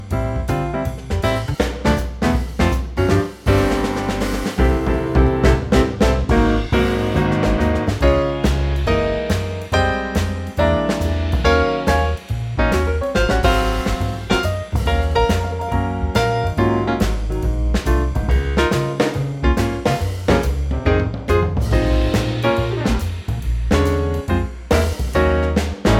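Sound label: Ab to Db trio, Backing Tracks for Male Vocals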